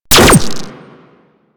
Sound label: Drone Laser Fire; Super Moa Drone